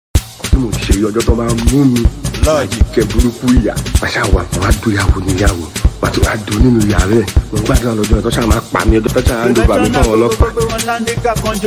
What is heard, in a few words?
Professional Beat